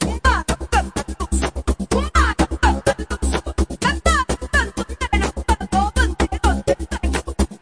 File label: 126 african stutter vox and didgeridoo; VOCAL OR VOXY LOOPS